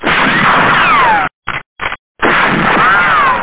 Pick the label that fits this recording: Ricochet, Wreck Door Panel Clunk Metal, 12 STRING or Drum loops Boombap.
Ricochet